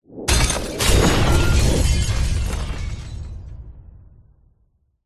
explosion boom medium heavy breaking glass

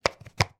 baby toy wooden puzzle piece place in board

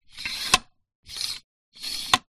technology microphone stand adjust movement

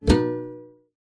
musical guitar acoustic nylon grab neck strings

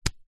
human hand tap leg skin

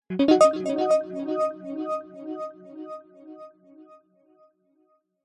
multimedia game sound nature game generic

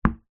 sport tennis ball bounce single on wooden floor; zapsplat

